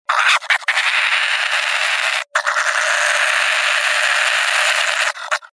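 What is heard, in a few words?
European badger, Meles meles, threat; barsuk evropeiskii; ugroza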